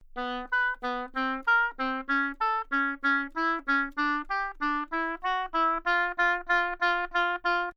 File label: oboe B3 phrase mezzo-forte tenuto; solo instruments